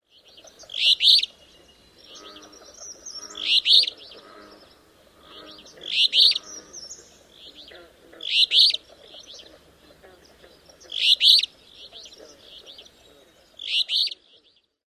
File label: song